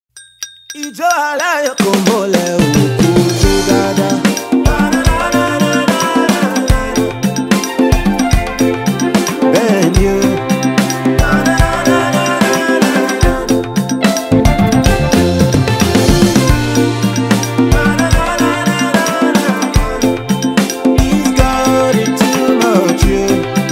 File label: Miracle Rain, gospel music; rocktown